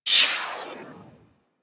Skate Stride; Warframe; Corpus Modular Crewman; Skate Movement

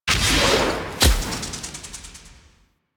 Zeal Pre Teleport Cin Disappear; Warframe; Emissary Season Two

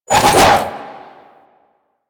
Arch Wing Sword Spin Whoosh; Warframe; Weapons

